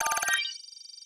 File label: loyalty Scan